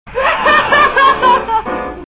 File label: Laugh